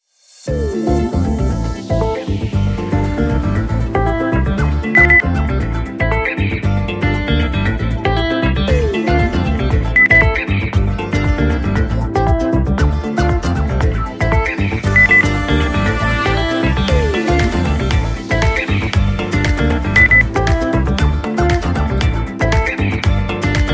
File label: lounge